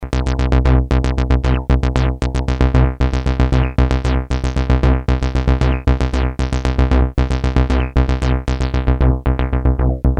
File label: bass, Solton Project 100 (198x) rare Italian analog polysynth with 12 DCO oscillators, SSM filters; Bass: bassliner; Solton SM100